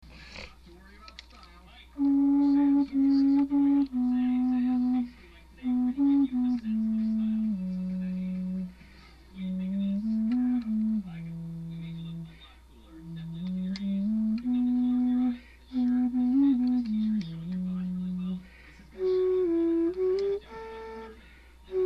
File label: bass whistle; jubilee; bass whistles